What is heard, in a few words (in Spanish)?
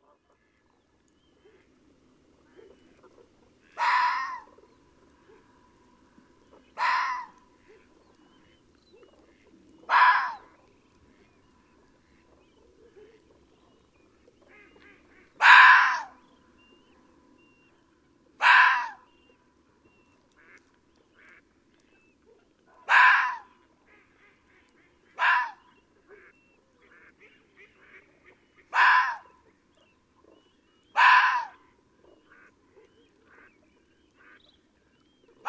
Vulpes vulpes - Zorro rojo